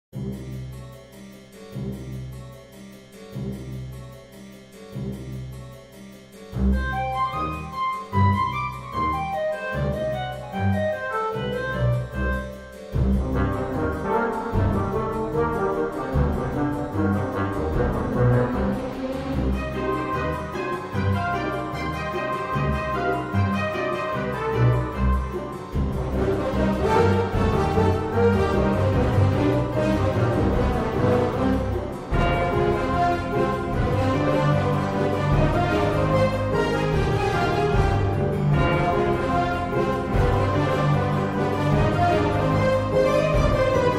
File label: Orchestral Remix